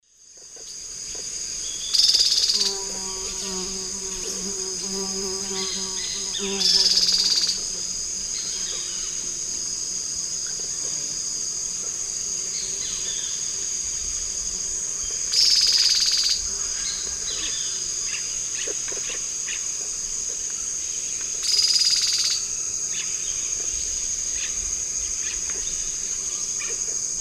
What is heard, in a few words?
Sclateria naevia, alarm call; S. America; Eastern Lowlands